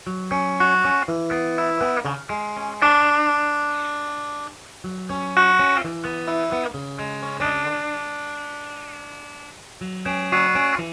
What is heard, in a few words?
digitizer sound